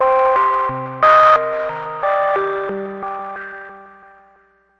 crunchy pretty synth; REMIXABLE COLLECTIONS

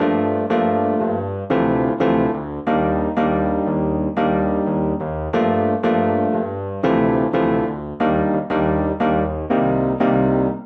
180 epiano chords D; KEYS ORGAN PIANO LOOPS

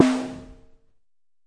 Processed Ethn Perc, Doru Malaia Percussion

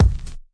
vinyl dirty kick; Kicks

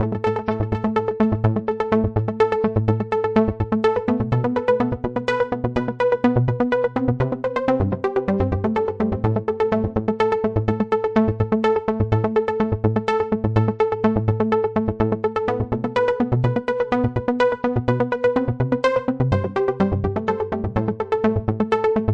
125 Synth; remixable collections

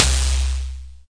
dx100 Synth Perc C3; drum machines

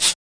hat; Hats

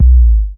gliding 808 sub

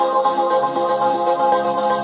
choir pulse pad; SYNTH AND ELECTRONIC LOOPS

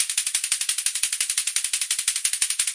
174 straight 16ths perc, drum loops and breaks; 161 to 180 bpm

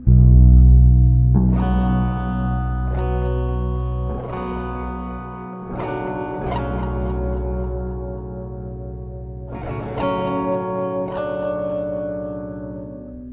guitar space; guitar loops; the mystery begins

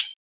nasty stormfront; Electro FX; UNUSUAL KITS and FX

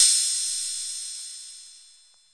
Korg KPR-77, drum machine

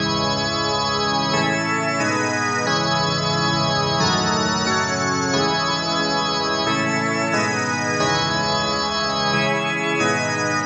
090 drifting pitch pipe organ melody; SYNTH AND ELECTRONIC LOOPS

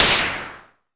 Arturia MiniBrute noiz

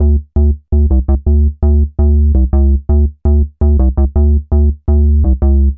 bass trubble bassline, bass loops; 166; melodic loops

